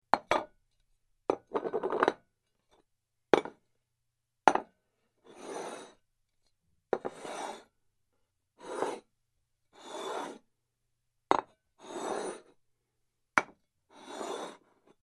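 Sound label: dinner plate sounds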